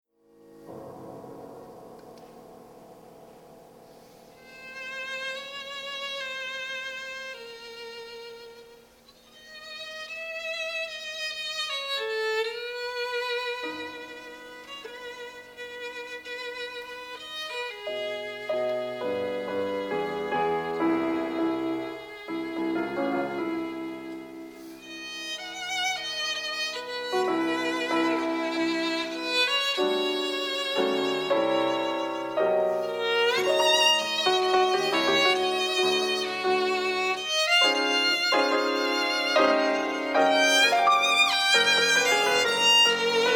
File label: Violin Sonata; Third movement